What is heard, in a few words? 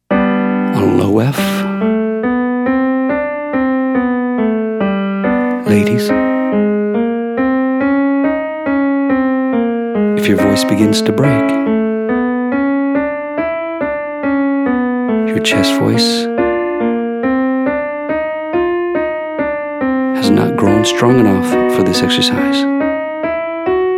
Exercise Irish Oh Ladies; singing lesson